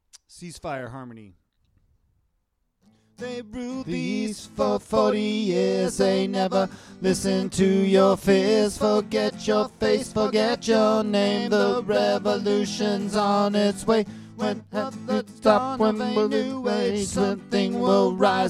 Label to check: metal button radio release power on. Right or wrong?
Wrong